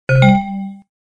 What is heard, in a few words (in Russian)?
vstavljajut fleshku